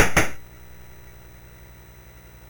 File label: BASIC sid MOS6581R4, GAMES; Sword of Fargoal